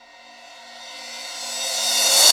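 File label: Reverse Cymbal; Zero-G Jungle Warfare 1, 2 & 3; 90 s Sample CDs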